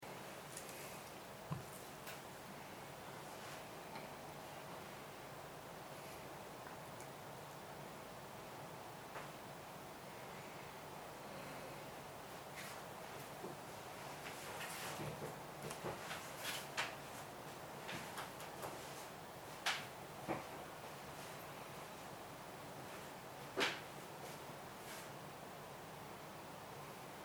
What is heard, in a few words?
Pipe On Foil